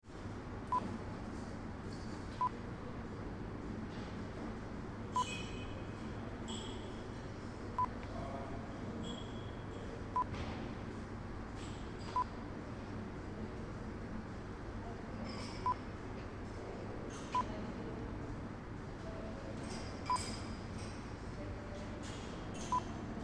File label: Large Hotel Lobby